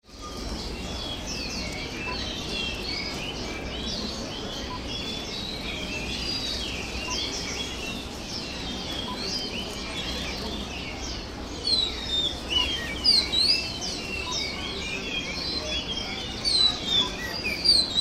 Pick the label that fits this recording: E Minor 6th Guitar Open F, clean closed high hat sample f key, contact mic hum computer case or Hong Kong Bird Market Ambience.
Hong Kong Bird Market Ambience